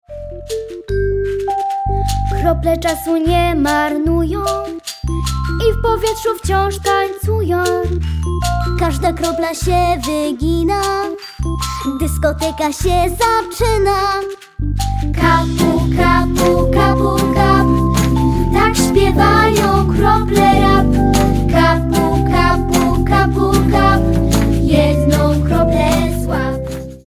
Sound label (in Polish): rap